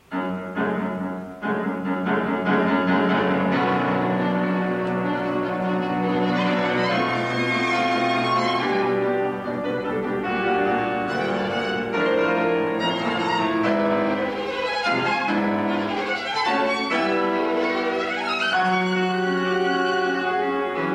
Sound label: scherzo for violin and piano